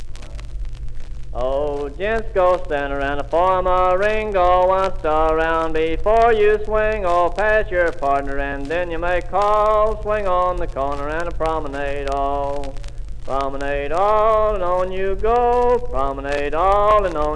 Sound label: dance square